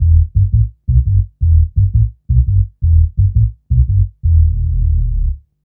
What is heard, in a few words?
Hum Bass; Drum & Bass; Fluid Dynamics